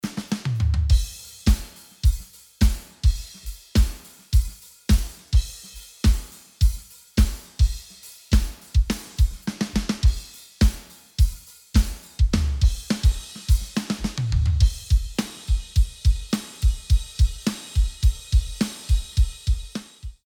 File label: Drums; ozone; pultec shootout